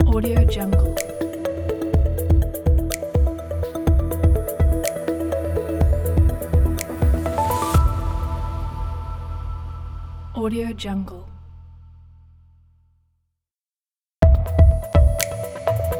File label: technology logo pack